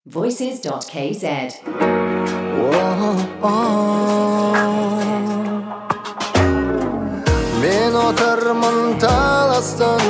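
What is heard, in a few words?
vocal Kazakh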